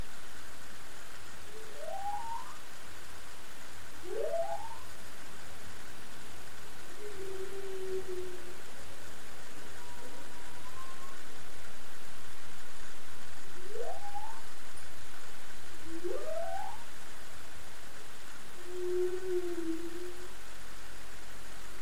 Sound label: Hydrophone